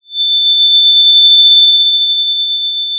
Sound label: Sound of a police car siren